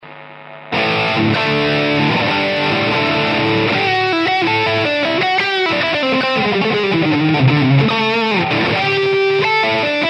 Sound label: solo 100 S drive, PODxt Live